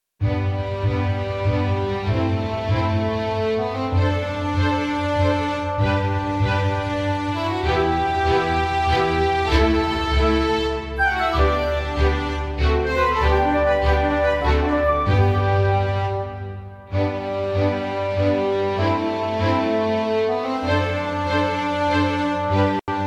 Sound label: Piano pianissimo